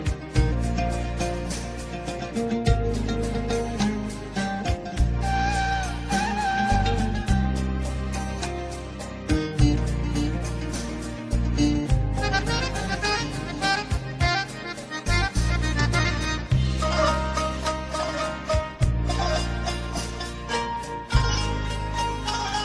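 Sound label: arabic instrumental; titanic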